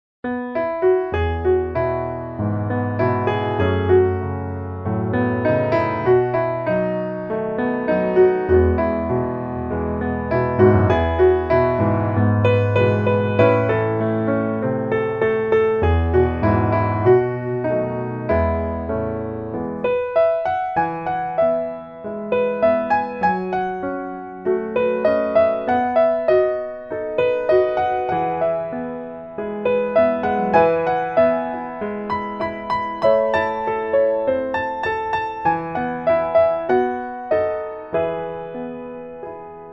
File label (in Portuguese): piano